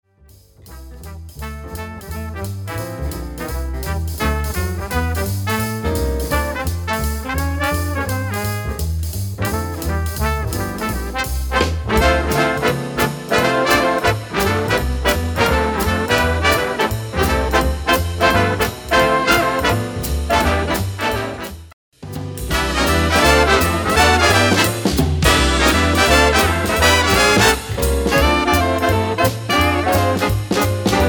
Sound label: swing